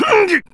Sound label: Grunt; Lewd SFX